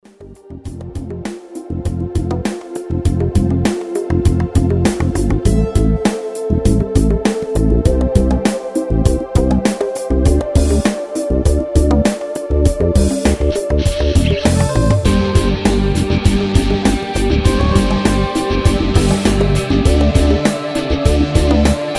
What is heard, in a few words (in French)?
instrumental